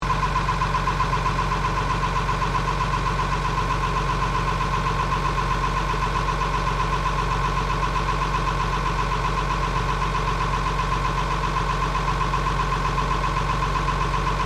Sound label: Truck IH 1969 C1800 Idle; Classic comercial vehicles; Trucks (Sound Effects:Vehicles)